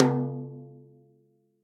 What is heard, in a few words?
RACKTOM 1209 JAZZ brush off center